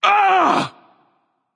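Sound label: nonverbal ouch; smite; voicepack; merlin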